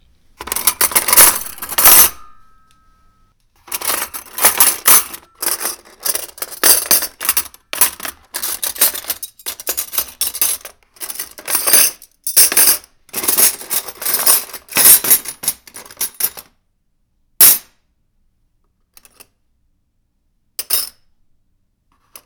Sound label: Silverware Drawer